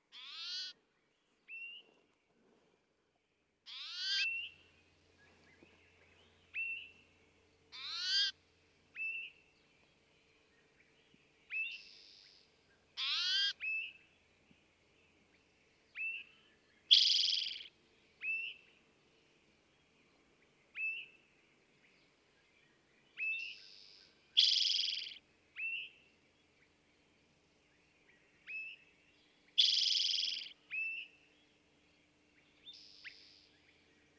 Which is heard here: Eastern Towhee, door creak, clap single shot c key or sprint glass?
Eastern Towhee